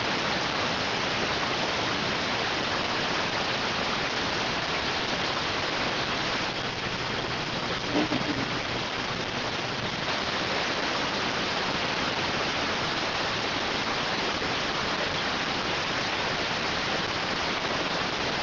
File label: rtty rx